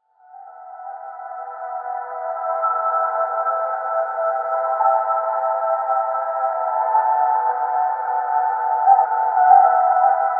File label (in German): schattenmelodie; seleya; novisad